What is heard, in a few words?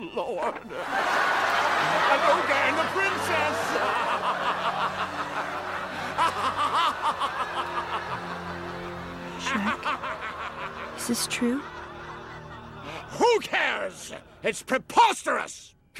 Ringtone